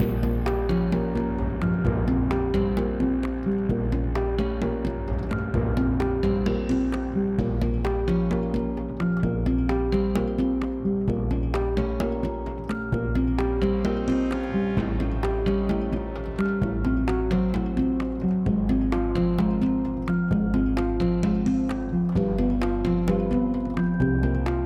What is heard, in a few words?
level loop; vikings